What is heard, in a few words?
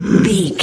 voice female growl grunt